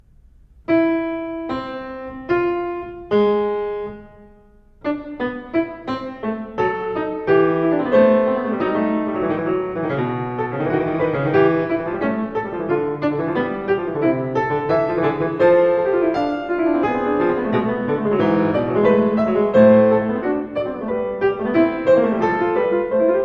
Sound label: Prelude And Fugue No 20 In A Minor BWV 889 Fuga, Clavier; Johann Sebastian Bach